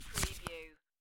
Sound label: Playing Cards Slide One Card Off Top Of Deck